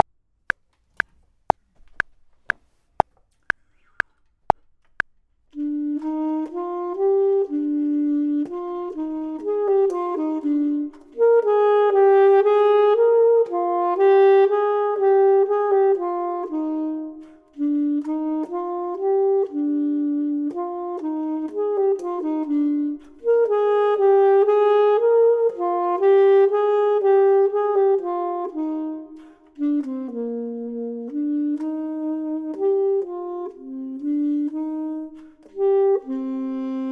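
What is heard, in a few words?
bottom, Saxophone and Clarinet; voxman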